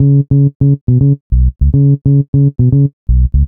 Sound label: Bass, Cinematic Funk Urban; Sonic Foundry (Sony Creative Software); Cinematic Funk Urban Tone Poems